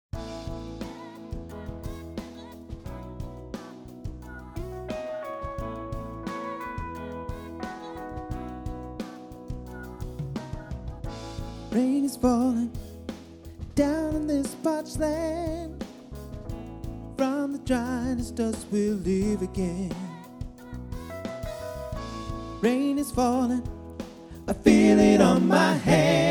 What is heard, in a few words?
raining vocal mix